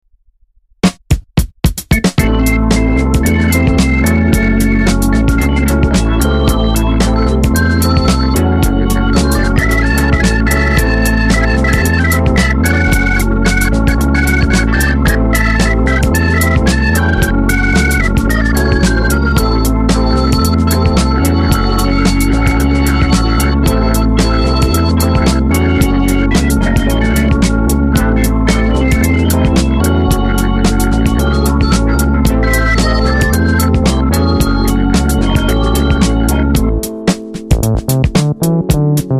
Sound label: Light My Organ